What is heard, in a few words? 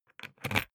briefcase plastic latch lock key insert ringing; industrie wirtschaft; bueroarbeit